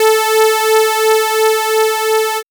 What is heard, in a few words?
SWIRL; X-Static Goldmine 1